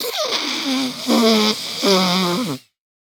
Cartoon Human Male Blowing Nose Long

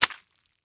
Liquid Water Mop Wet Smacking Concrete

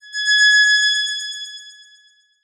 Transition Musical Tone Warble; Production Element

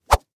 Staff Whip Thin Fast, weapons; AdobeCC

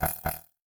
Science Fiction Sci-Fi Electronic Beeps Two

